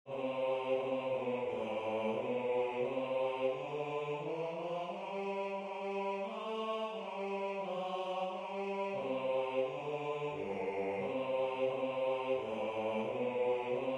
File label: Bass